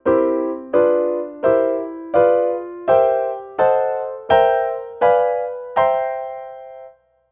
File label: Piano Blocks